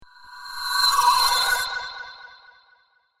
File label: Trapped Magic Web Spell; fantasy sound effect; role playing games, magic videogames